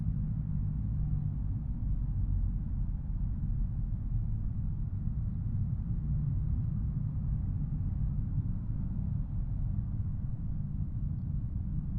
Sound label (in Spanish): Trafico; PlazaBeata